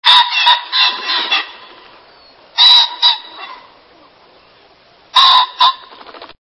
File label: Ring necked Pheasant